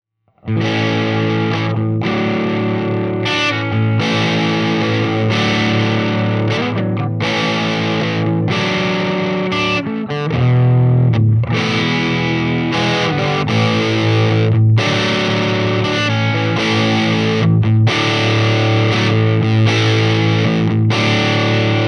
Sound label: TRIWATT 12AT7 OD LP Bridge Fanes MV way down; trinity